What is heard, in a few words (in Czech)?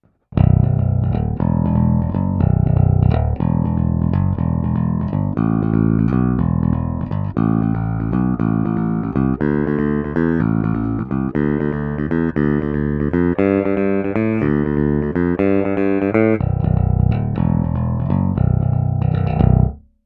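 Baskytara Schecter SLS Elite, pražcová, 5 strun, trsátko, Ernie Ball #2850 Nickel plated steel, roundwound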